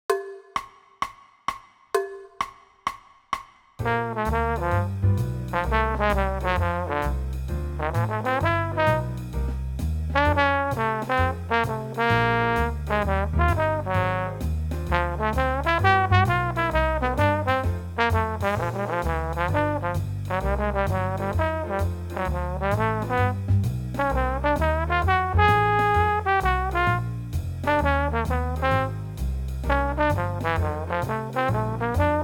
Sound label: Senior Jazz Trombone